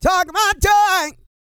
SING

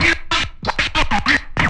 SCRAT; E-Lab; X Static Goldmine 2; akai

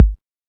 HSE-BD

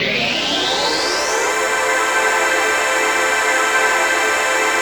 ATMOPAD; Best Service; XX Large; Pads

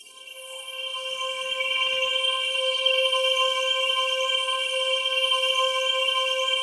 PAD, ATMO PADS; Best Service; XX Large

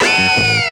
STAB

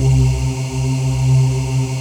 VOICE PAD, ATMO PADS; Best Service; XX Large

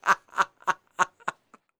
LAUGH

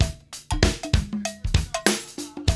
BREAK

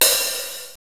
OHH; E-Lab; X Static Goldmine 1

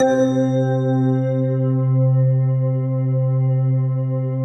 PAD, Pads; Best Service; XX Large

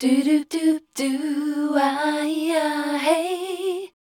MEL C, Voice Spectral

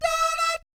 "DAH DAT", vocal jazz fx

